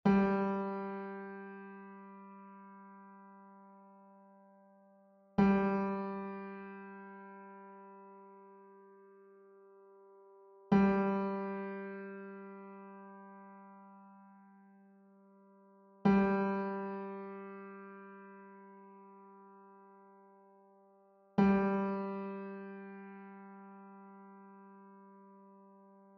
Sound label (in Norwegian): stemmetoner for gitar g strengen g3